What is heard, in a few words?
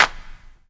CLAP, Dance Drums